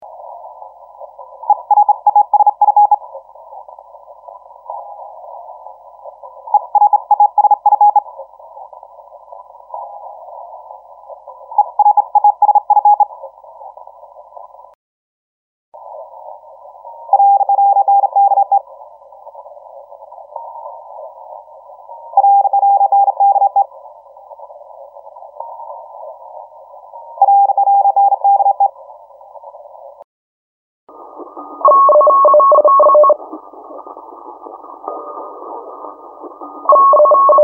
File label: CW